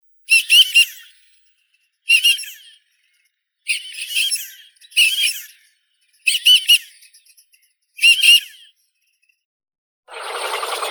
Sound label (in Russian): chirok mramornii marmaronetta angustirostris